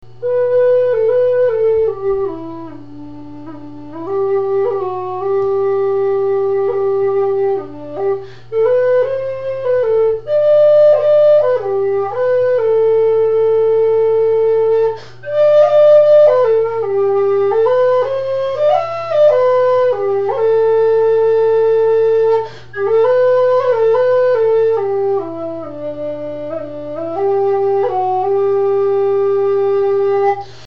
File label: low whistle; buachaill